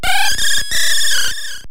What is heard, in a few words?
powerup microphone; kirby s dream land